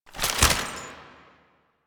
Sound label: Belt Fed Rifle Reload; Weapons; Warframe; Tenno; Frumentarius